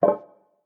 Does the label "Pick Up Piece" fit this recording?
Yes